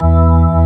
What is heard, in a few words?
Org E3; Best Service Gigapack 2